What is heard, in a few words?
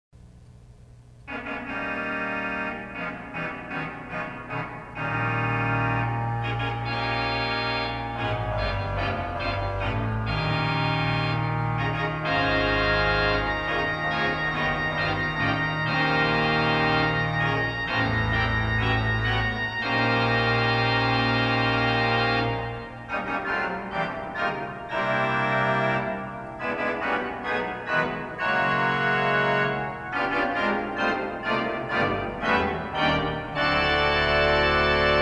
Processional Fanfare for Organ